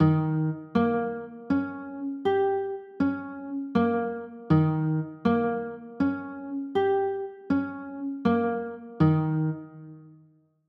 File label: e flat 7, chords